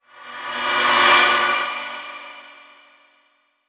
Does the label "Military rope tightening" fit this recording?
No